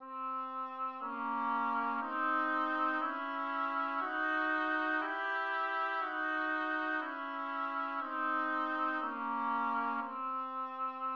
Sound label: Istrian mode on C